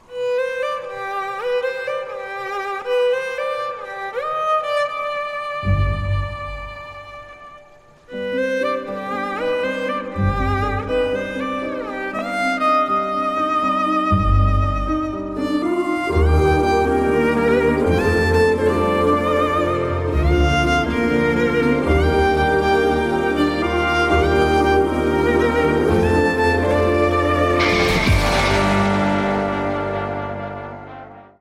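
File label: Retro background music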